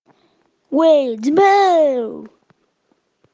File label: weee zimbowww